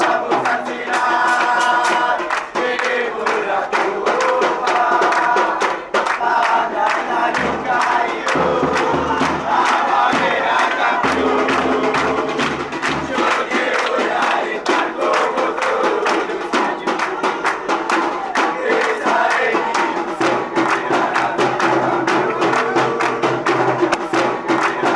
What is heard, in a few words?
we want the cup, fanchants